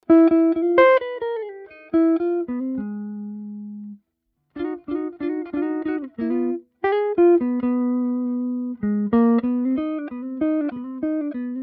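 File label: JAZZ GUITAR EQ BYPASS; JAZZGTR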